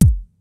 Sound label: MEGA KIT, DRUMS; Kid Nepro; Elite